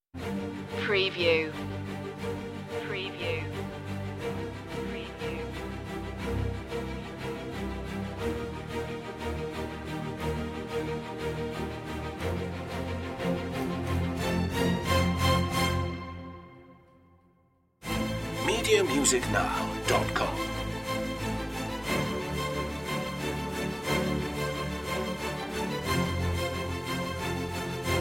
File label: strings only